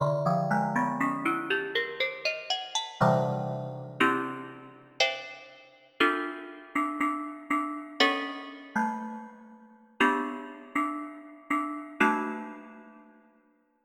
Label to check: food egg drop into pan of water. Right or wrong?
Wrong